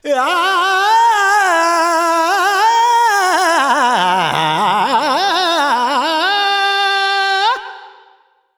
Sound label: Expression, Vocals Male World Pop